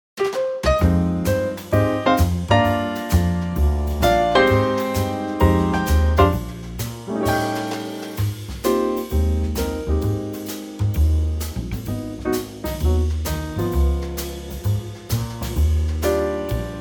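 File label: Eb Trio, Backing Tracks for Male Vocals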